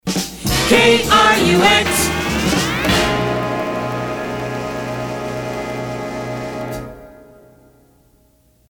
Time Chime; KRUX; PAMS

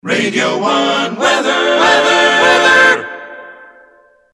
A Capella Weather; BBC Radio One; PAMS

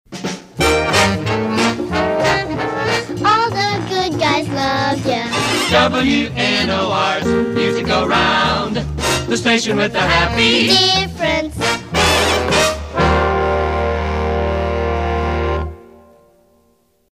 Sig Workhorse; WNOR; PAMS

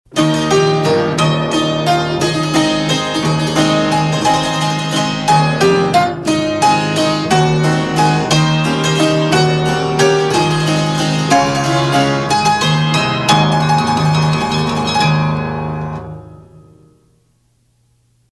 Rinky Tink Piano; WBAG; PAMS

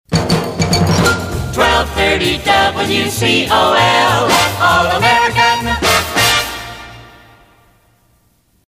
Short Sig; WCOL; PAMS Numbered Series

